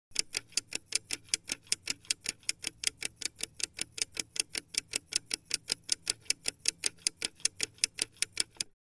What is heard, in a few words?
Time passing fast clock tick tock